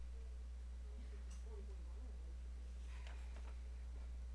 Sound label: sparrow